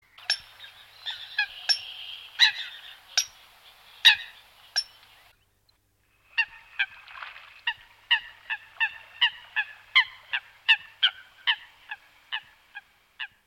Focha comun, pajaros

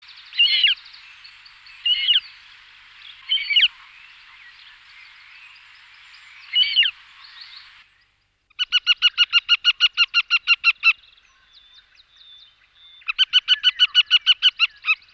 Aguilucho lagunero, pajaros

